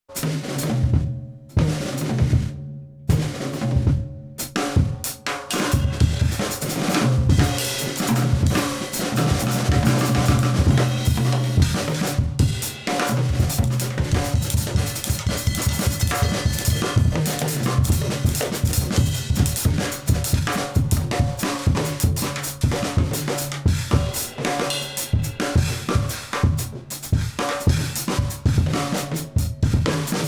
Drum Solo Attack 5 Release 12 5

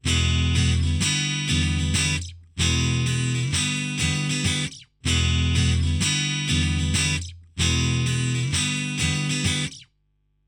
Portico 5024 NT3 silk egtr

